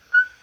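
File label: whistle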